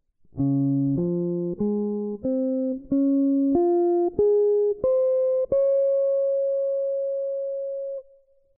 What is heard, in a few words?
jazz guitar improvisation; practice routine